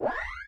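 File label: plasma rifle power up; allegiance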